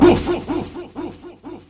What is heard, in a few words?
bear woof; animals